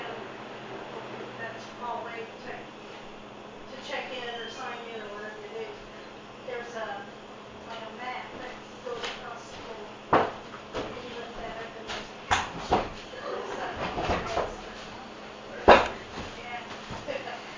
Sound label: women oooing; Women voice saying oooooo; like she's creeped out. 13 seconds into clip.; Springfield Carriage Co